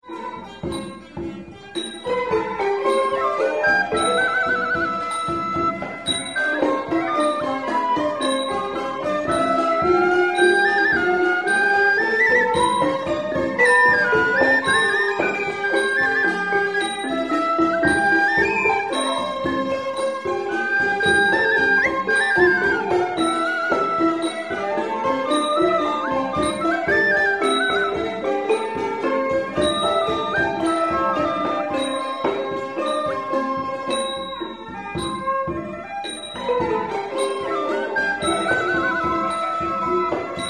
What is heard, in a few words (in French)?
cambodge pnompenh 10 instruments concert